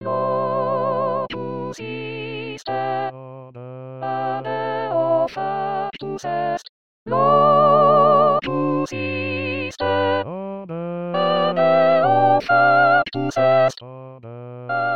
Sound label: Locus iste sop